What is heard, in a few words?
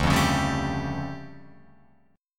D Minor Major 11th down Guitar Open F; DmM11 strummed; JGuitar